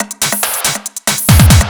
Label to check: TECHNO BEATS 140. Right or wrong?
Right